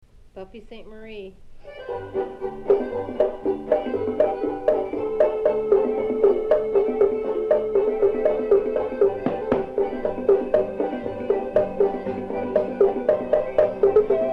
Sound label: Fusion Polka; Sartres Pupils